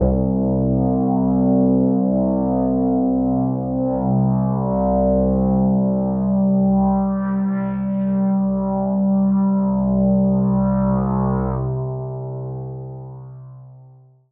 BASS Hit and Miss

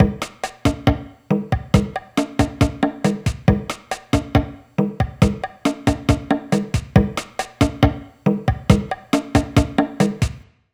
Drums no predelay

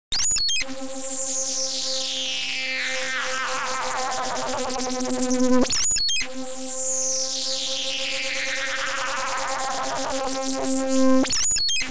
Digital Fly; Circuit mode

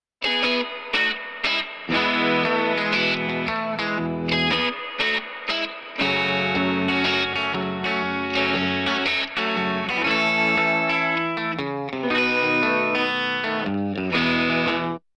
guitars with effect

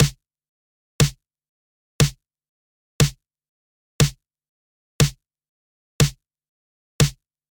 Snare Dry; feedback echo plugin; Newfangled Audio

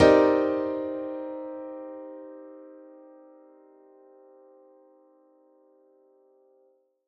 c minor chord sustained

